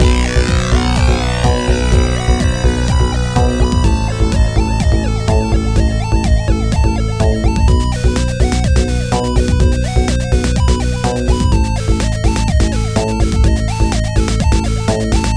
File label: boss theme; 99Vidas Definitive Edition